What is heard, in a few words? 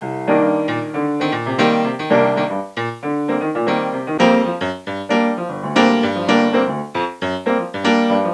Jazz